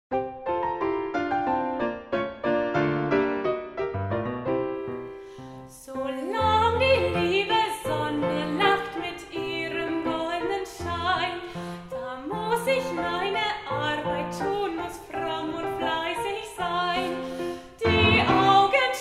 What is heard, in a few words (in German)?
Gesang; Koessler